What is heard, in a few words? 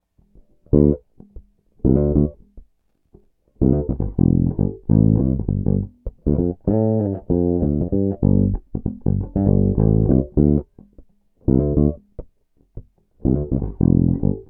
Humbucker Bridge Fingerstyle Tone DOWN; Peavey based pickup Shootout